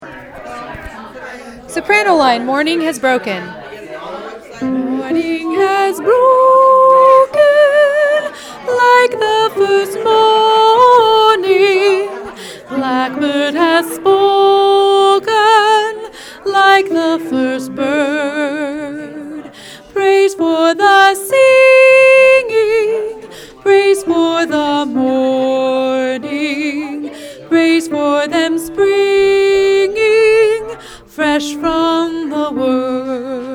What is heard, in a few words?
Soprano